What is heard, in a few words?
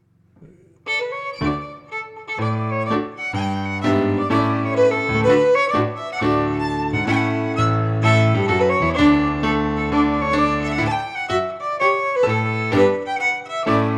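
March, Scottish Fiddling; Morrison 1797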